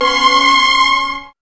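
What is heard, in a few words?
string chord; X-Static Goldmine 1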